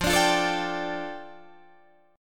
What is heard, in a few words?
F 6th Add 9th F down Guitar Open D, chord